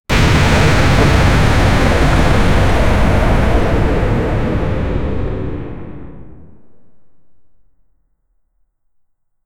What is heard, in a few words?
game sound effect, steam release